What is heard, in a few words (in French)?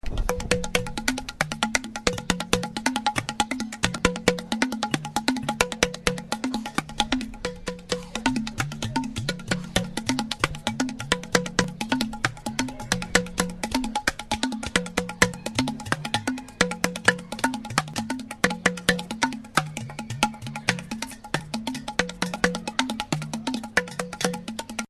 multi udu tres leger; millau; Divers tambours